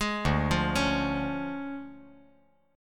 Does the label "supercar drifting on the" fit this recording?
No